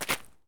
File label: jump concrete; Escape from Tarkov